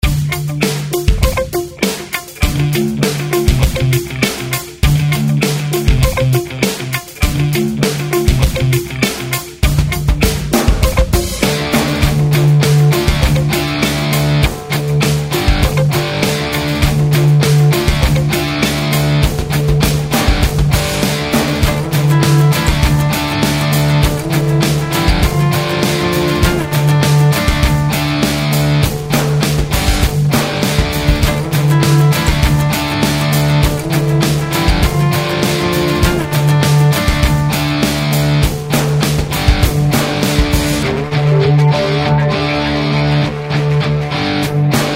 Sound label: Rock